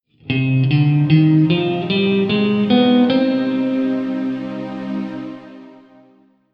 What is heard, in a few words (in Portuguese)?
Escala de Do Menor Harmonica